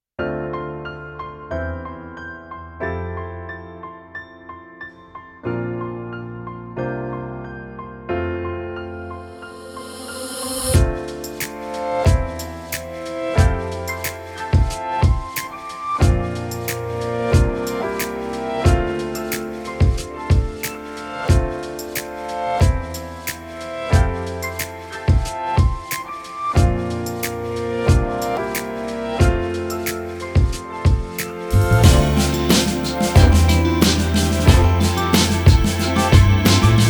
Instrumental

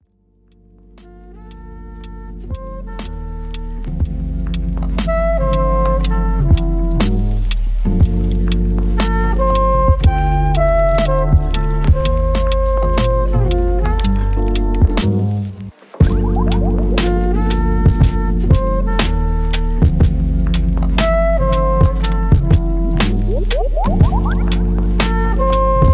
Jazz

